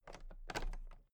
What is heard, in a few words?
toolbox latches open very soft